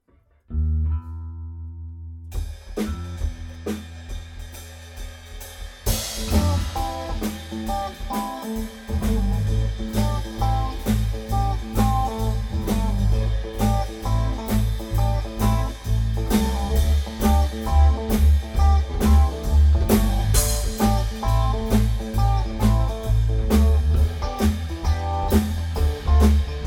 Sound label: Blues